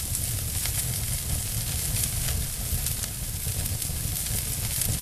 fire sizzling

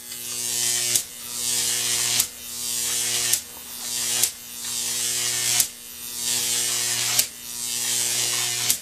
electric sparks

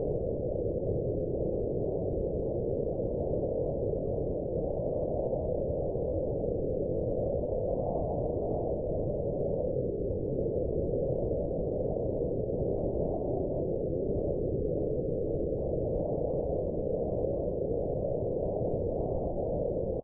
wind stormy

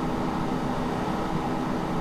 fan pc

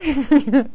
hehe

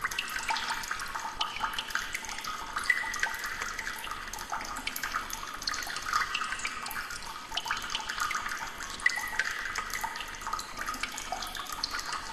water rippling

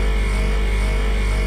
freezer hum

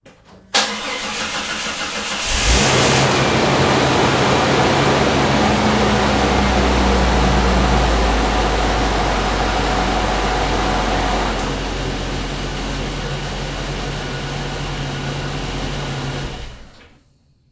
car engine on off

